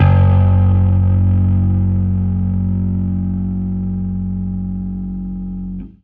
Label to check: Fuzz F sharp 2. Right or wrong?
Right